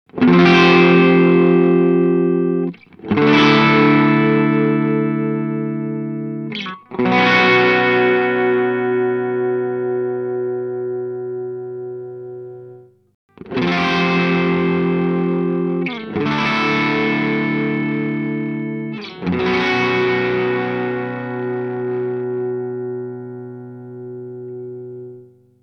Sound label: Earthquaker Devices Zoar 18 vs 9 Volt